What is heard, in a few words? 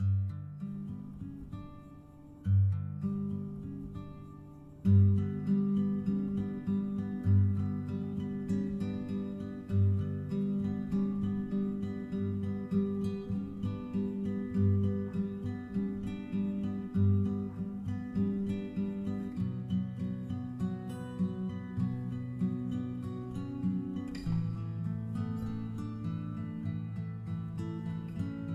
Menu Music (Guitars Stem); ps4 ps5 switch windows xbox one xbox series xs